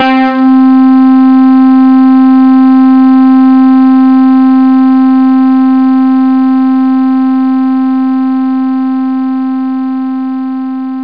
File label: poly C3